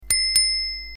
Fahrradklingel Pushbell

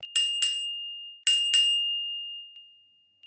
Fahrradklingel Alarm

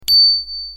Fahrradklingel Clever

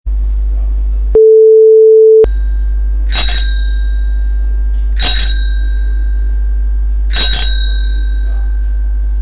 Fahrradklingel mini; Bremen Koordinaten

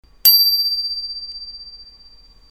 Fahrradklingel Messing mini; Universal

